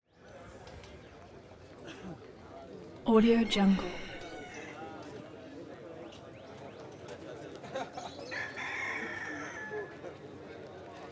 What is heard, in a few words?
roosters calls underlying crowd walla